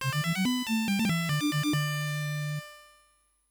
ditty; arilou

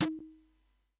boom small

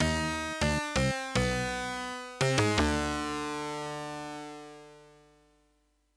ditty; ur-quan

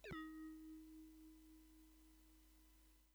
launch; ur-quan

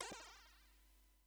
shot; shofixti

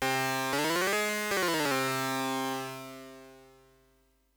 ditty; ilwrath

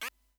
bite

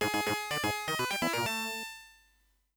ditty; yehat

